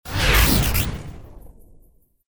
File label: wipe digital